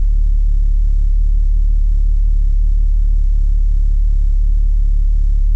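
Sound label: Ambience Sci-fi Energy Loop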